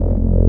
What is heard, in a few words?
analyze weakness pass effect; Earth and Beyond; EA Games